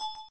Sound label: select move